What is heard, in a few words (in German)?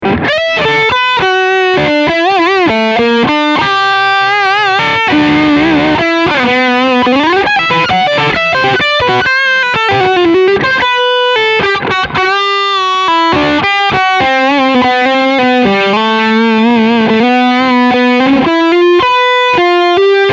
Steg Overdrive